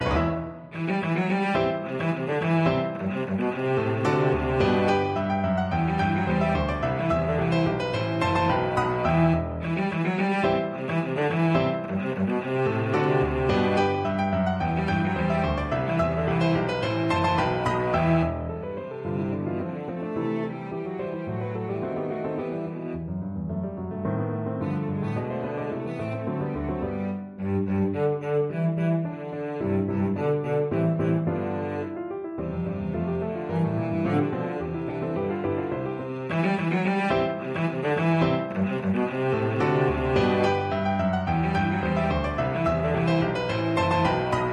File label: Fossiles, cello